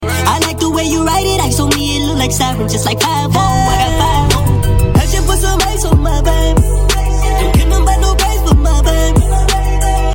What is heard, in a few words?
sped up tiktok remix